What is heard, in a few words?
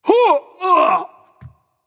ouch; smite; thor